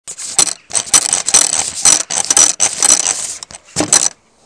Mailbox

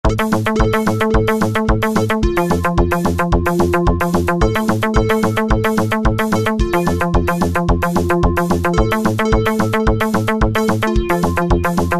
Beat

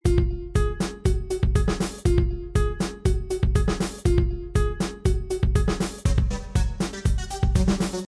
Beat

